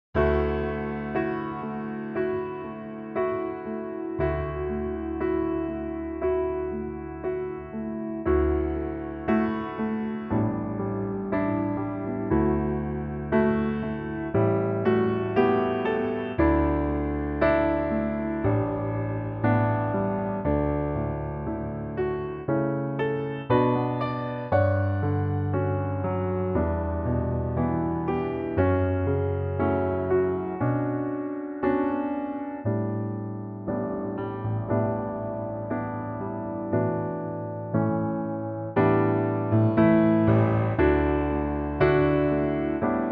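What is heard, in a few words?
piano track D Eb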